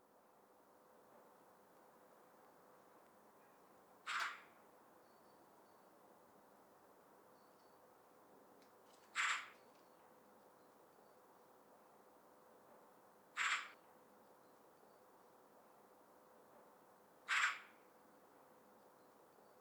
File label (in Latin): Pica pica; SETE